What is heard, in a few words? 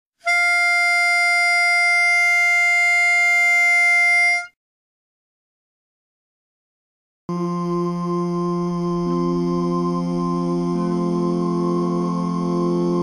Bass